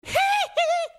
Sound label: hee hee